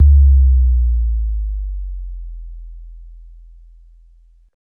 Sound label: Sub, drum